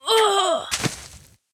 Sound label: Overseer Death